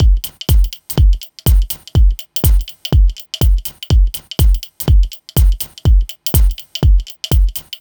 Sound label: 123BPM DRUM, Drum Loops